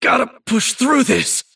move pain; davion; dota

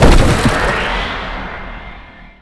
hammer return; weapons; celestial; dota; dawnbreaker

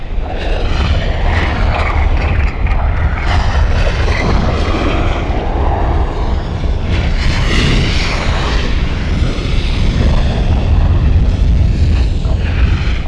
dire pit loop, ambient soundscapes; dota

